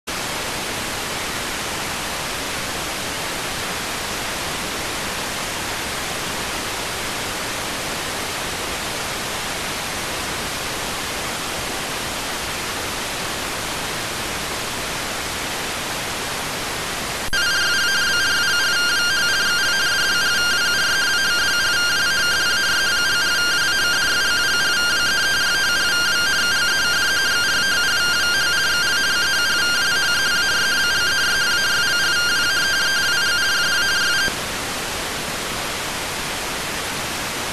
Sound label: rtty rx